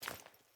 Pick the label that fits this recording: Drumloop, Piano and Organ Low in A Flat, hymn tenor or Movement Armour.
Movement Armour